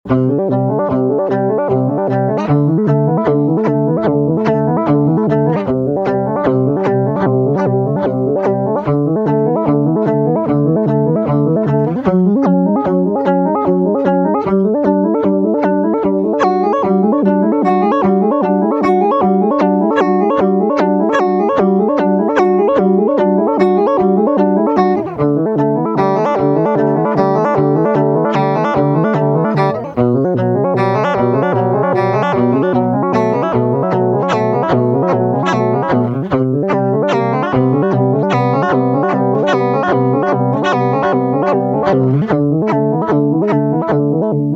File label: decade seq, CD4017 decade sequencer